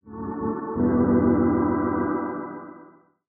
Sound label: D Synths, One Shots; Keys & Synths; Touch Loops; BlissedOutBeats